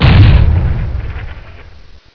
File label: GUN